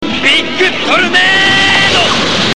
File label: big tornado